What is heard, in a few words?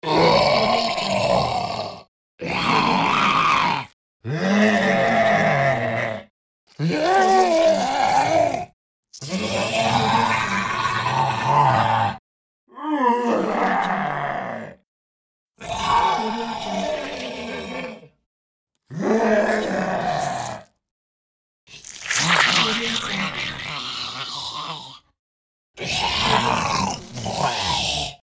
ghoul